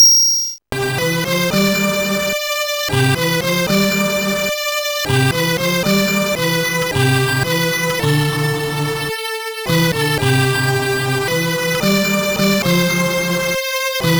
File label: Saints, Music Box